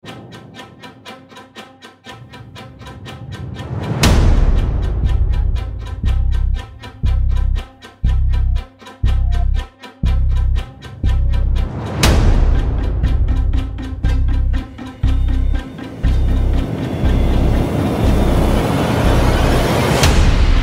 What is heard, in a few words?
trailer music; bird box; netflix